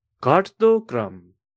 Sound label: descending